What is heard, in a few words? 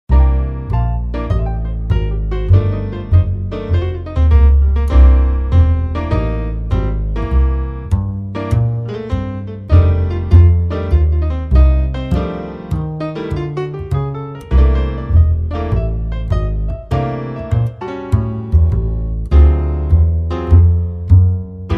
Jazz Piano & Bass